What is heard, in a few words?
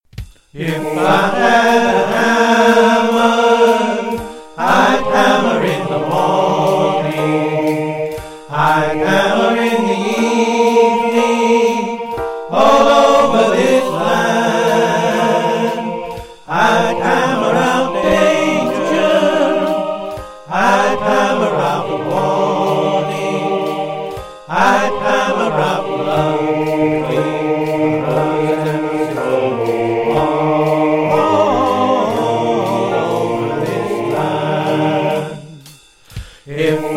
swing all voices vocals